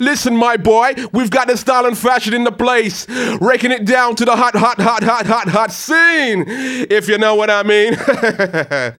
HAL RAGGAM, RAGGA-MUFF A maj

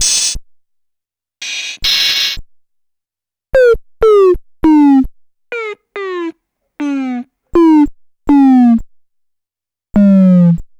Stylophone Beatbox

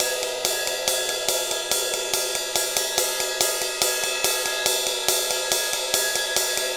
Ride Cymbal Pattern; Essential Percussion; Ride Cymbal Patterns

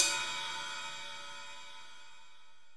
ride, Percussion; realdrum

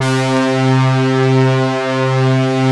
BRASS, PADS; Masterbits; Vintage Voltage

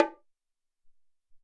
Bong Hi S, Alesis SR-18

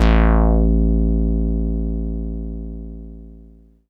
Booty a2, Korg Poly-800

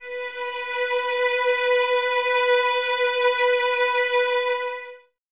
B 4, String Ensembles Violins; Miroslav Vitous; 11 Violins

